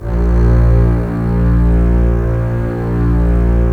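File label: STR CBS, Strings; Roland - LA Composer vol. 4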